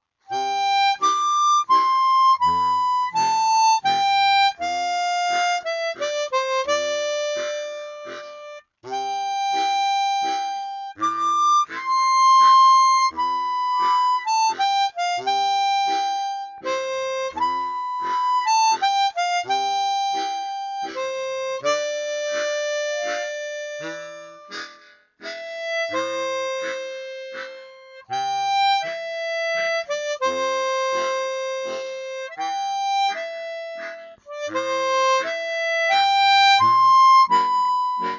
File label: Harmonica; Suzuki Chromatic SCX-48; Suzuki Chord SCH-24; "Breakfast at Tiffany's"